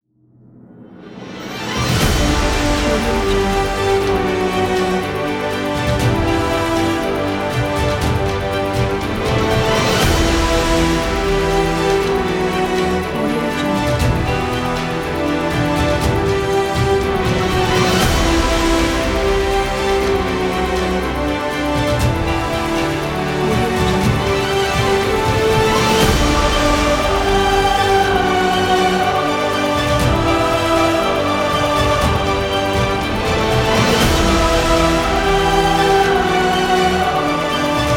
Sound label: epic powerful uplifting orchestral; vuorinen